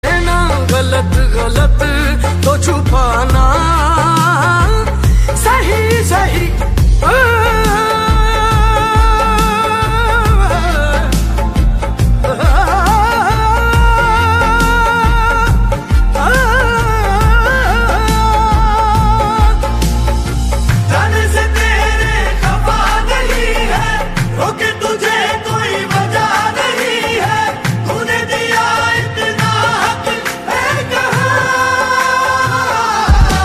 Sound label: SONG RINGTONE, HINDI SONG